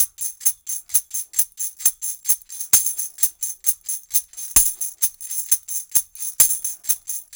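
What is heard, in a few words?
TAMB, tambourine; Drumdrops In Dub VOL-1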